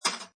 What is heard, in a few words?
Pinball Sound Impact With Loose Metal Plates; Video Game Sound Effects